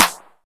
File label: clap single hit c sharp key; single hits one shots